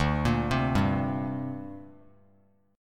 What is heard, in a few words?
D 7th Suspended 4th Sharp 5th down, chord; D7sus4#5 arpeggiated